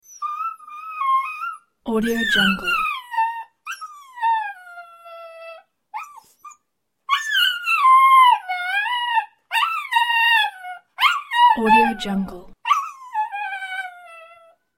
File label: dog whining